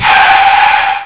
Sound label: braking, Kiddie Ride